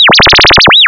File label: activate, probe